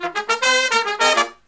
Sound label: Brass Riffs; USB Soundscan vol.29; AKAI